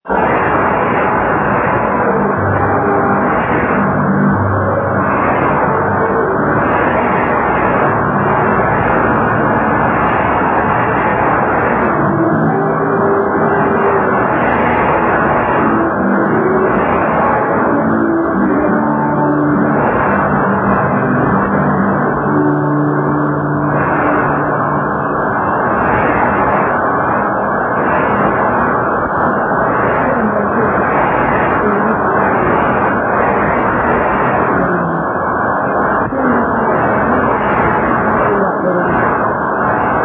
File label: 5050 kHz